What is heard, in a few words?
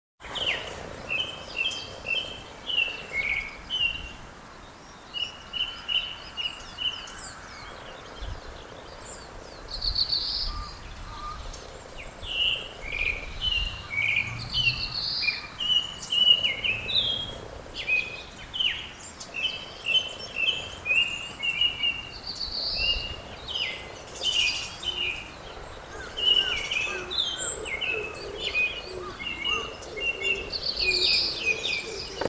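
kurotsugumi, Japanese thrush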